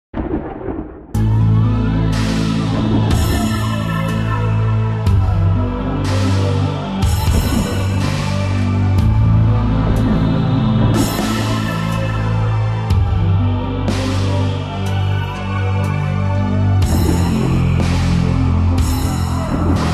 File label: guitar